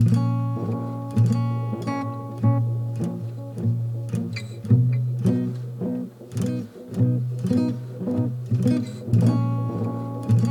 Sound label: Minor Chord Progression On Guitar With Echo